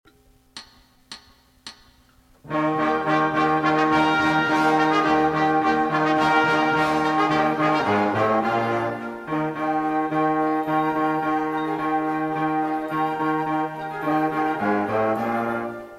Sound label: spring bari